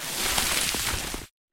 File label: water in mixer; builder